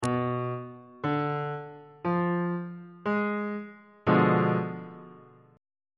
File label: f diminished 7th 2nd inversion on bass clef